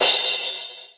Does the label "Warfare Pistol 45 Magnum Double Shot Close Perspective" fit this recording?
No